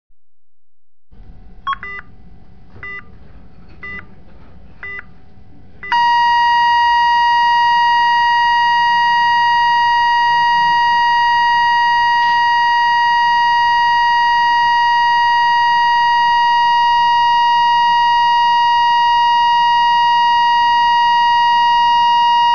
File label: heartbeat alarm